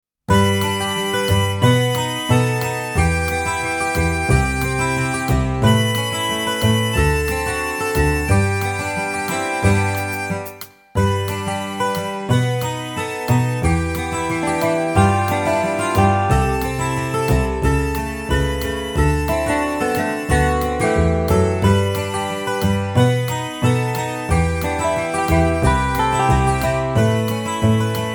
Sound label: Folksong